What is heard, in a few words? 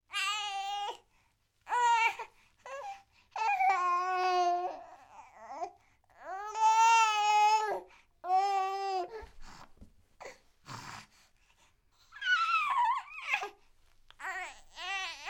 human baby 4 months upset vocal leading to crying; sound effects one